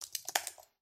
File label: Computer Keyboard Group; SoundsCrate